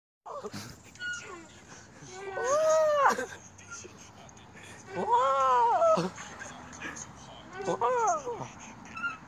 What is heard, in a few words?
moaning